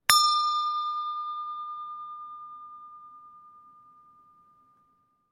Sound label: ding